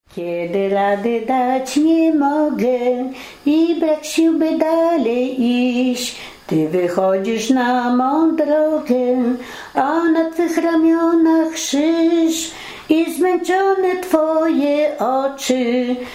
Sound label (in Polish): pieśń pogrzebowa